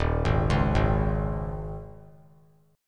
Eb 7th Suspended 4th; Eb7sus4; arpeggiated; JGuitar

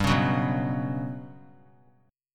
G Major 7th Flat 5th; strummed; JGuitar

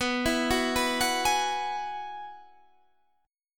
G sharp Minor 7th Sharp 5th, chord

